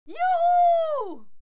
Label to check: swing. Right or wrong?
Wrong